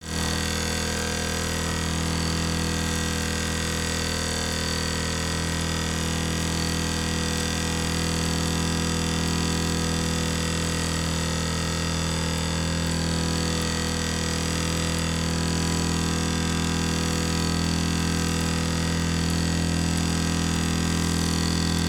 Tap

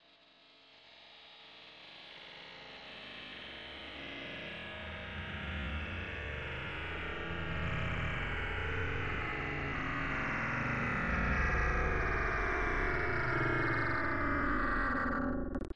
Glitchey Riser

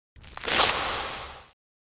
Jump From The Sand Ground

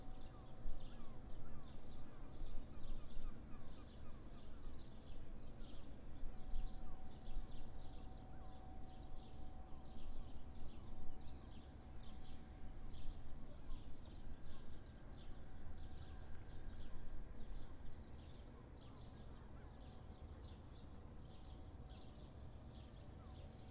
Porto Morning Riverside Birds

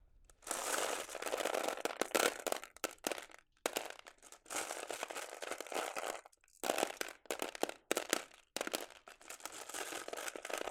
Marbles Dropping Into Other Marbles

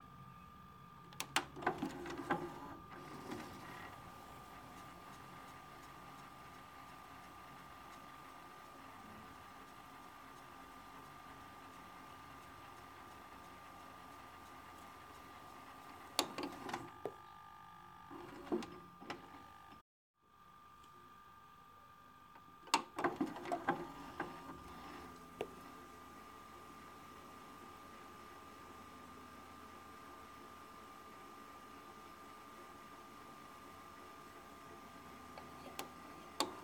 Vhs Machine Normal Fast Forward And Rewind

